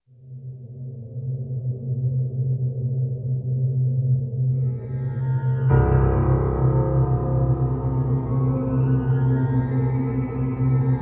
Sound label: Ominous